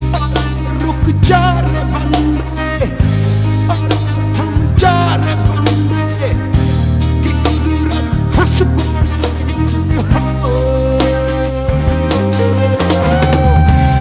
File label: recon music